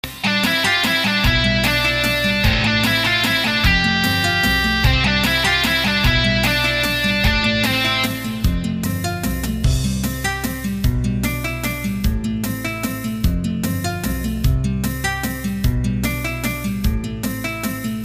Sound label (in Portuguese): musica sem nome